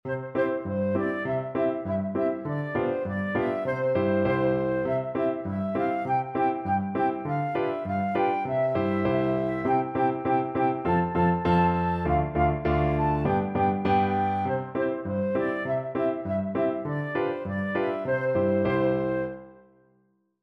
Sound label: auf der mauer flute